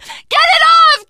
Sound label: scream while pounced, teen girl, survivor voice; source engine; left 4 dead 2